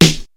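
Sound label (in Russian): малый барабан мелодия классический четкий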